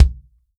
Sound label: FUNK KIT, REAL DRUMS; Best Service ProSamples vol.43 - Real Drum Kits